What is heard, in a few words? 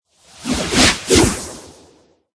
werewolf